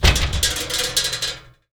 Oven Door, household rhythm samples Hits

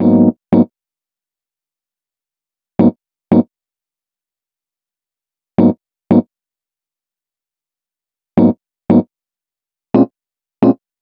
Rhodes, Cinematic Funk

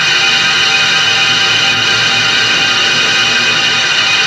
A#3 VAPOR; Northstar; The Wizard VOL-1

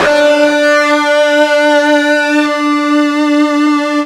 FUZZ D 3, ULTRA FUZZ, Guitar; East West; Steve Stevens Guitar Samples Collection

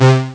Saw Jump, Amiga Soundtracker; ST-XX Amiga Soundtracker Sample Packs

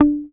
HOFNER MUTE, Bass

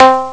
shamisen; Amiga Soundtracker Sample Packs

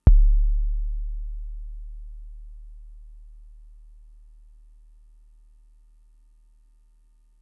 kick, Fricke MFB-522; KICKS

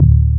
WIGGL, Synth Bass, Roland

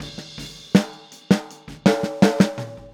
Boogaloo Fill Snare, 82 BPM, Drums; Sonic Foundry (Sony Creative Software) - Drums from the Big Room The Mixes

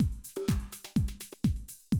125, Classic Drum Loops

